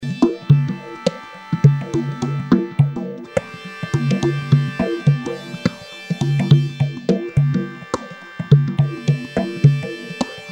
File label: phaser